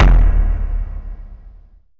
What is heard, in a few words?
Big Exp Int